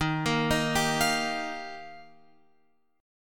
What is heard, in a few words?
D sharp Major Add 9th, Guitar Open F; arpeggiated